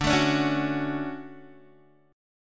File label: D Major 7th, D, down, Guitar DADGAD